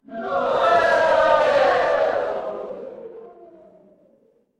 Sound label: crowd cheer